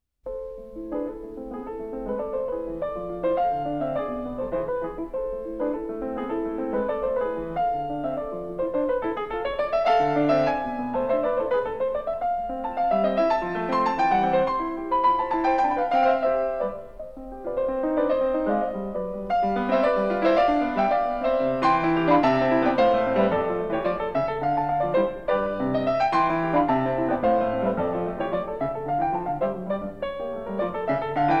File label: Piano Sonata in A minor Presto; No.8; K.310